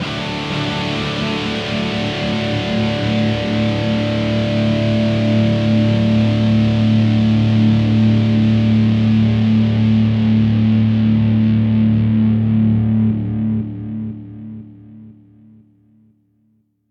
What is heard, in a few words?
D Major Chord Sustained And Nasty